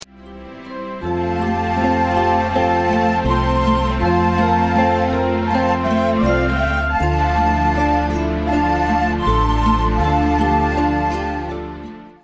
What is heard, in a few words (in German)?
melodie